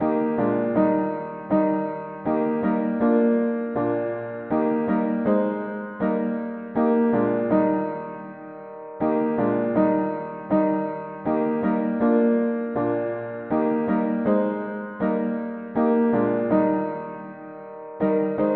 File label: mono piano, hymns; Son of God with joy we praise Thee